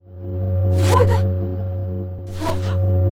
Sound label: peace idle